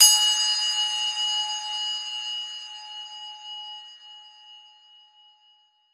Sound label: Dinner Bell; Elf